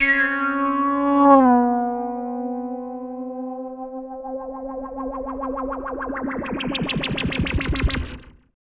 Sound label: WABBER ECHO, SYNTHETIC; AKAI Anime Sound FX